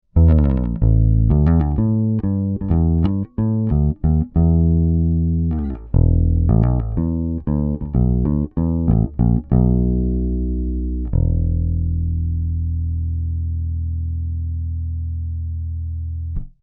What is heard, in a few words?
Bass DI